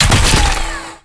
sharpsaw

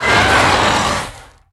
sweep tail circle roar

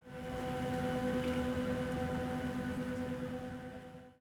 drone

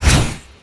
hammer

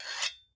sharpen

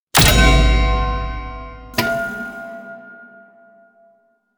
atk whitetimewand

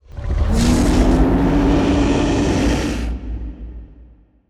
giant monster growl

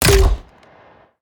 grenade

